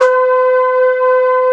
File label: breath pad, dance